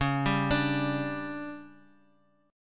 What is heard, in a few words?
D Major 7th Suspended 4th, chord